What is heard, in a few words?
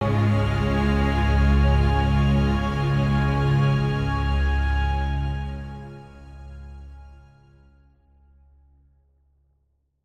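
Music Transition End Long; from dust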